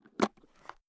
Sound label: Open; NzBulletStudio; NZ Simple LookDev